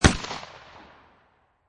Shot Gun Mossburg 500 12 Gauge Single Shot Exterior At 45'; Exterior, at 45 feet distanc